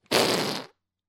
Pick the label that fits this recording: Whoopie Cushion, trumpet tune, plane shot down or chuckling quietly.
Whoopie Cushion